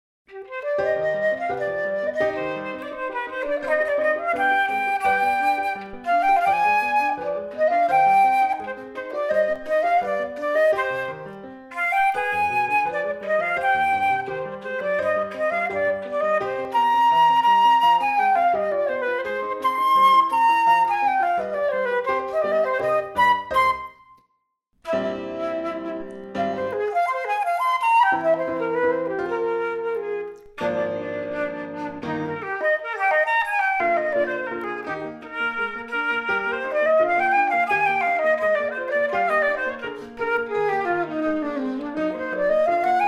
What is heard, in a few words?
Flute and Piano; Beethoven; Pathetique Sonata; 3rd Movement